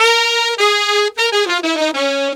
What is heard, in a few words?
Sax Section in Bb, Jazz; Sonic Foundry (Sony Creative Software); Jazz Solos and Sections